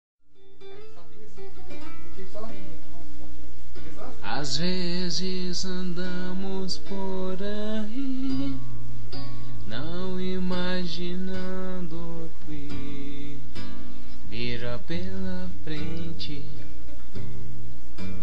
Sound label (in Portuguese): voz soul dialogo musical